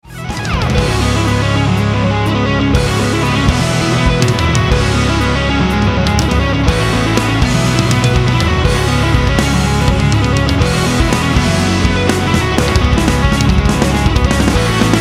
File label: harmonic ambiguity